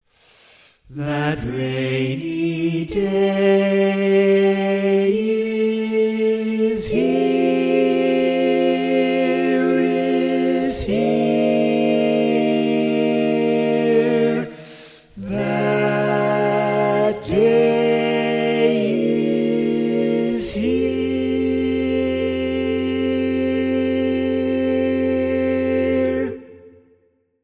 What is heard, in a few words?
Tenor, barbershop